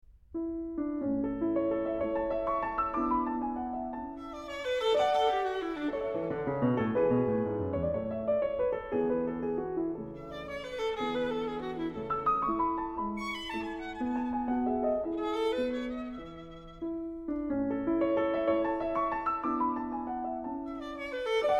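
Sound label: Violin Sonata in A, Variazione III; Mozart; K305 293d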